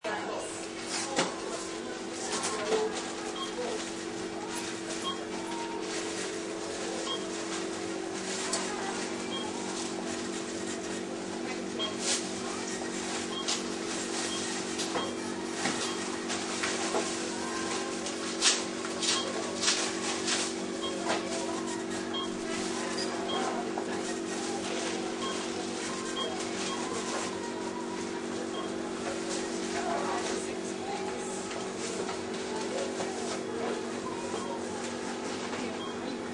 Store Crowd, Ambient store crowd. General store or shop, near checkout area. Tills beep; Tills beep when scanning bar codes. Air conditioning and people in b; Stores And Mall; Lynne Music